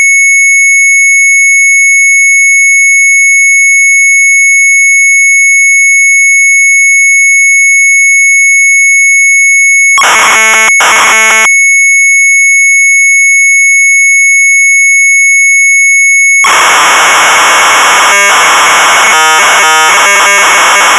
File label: MZ800 Tape, sharp; SIMULATIE uitleg; retromelsarchive